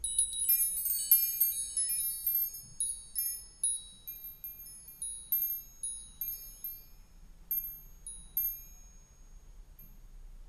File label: wind chimes